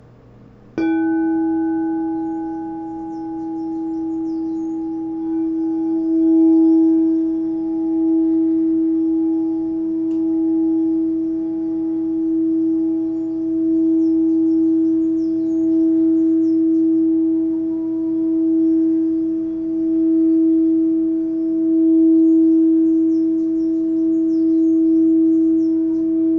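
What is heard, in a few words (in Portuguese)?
FOSCA E3, instrumentos de quartzo; escutar instrumentos de quartzo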